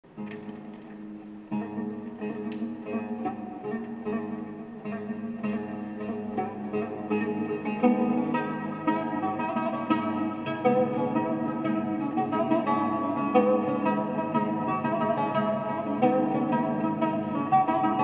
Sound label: loop